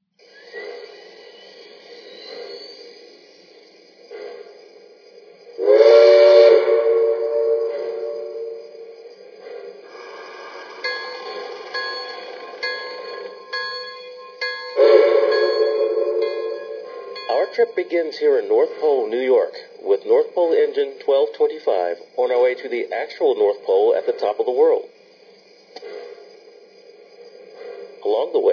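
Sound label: Berkshire; np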